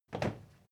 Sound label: step crowd march quiet single right; schritte